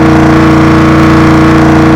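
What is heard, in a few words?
shelby on low,car engine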